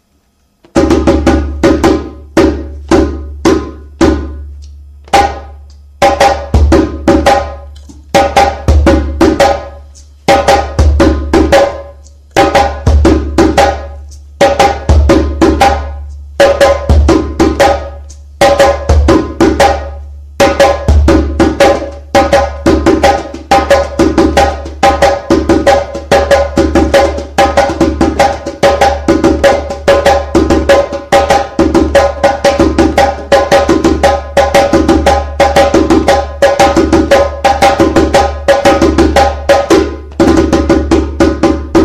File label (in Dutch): Sunu ritmes